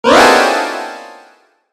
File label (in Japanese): びっくり音; 効果音se集